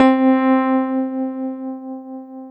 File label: KEYS, 160 BPM; Ueberschall; Drum & Bass Flash Trackz